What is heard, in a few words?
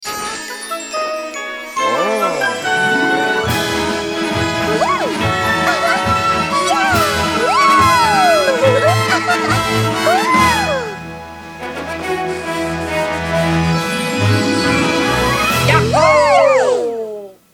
Ending Victory; SM3DWorld Soundtrack VOL2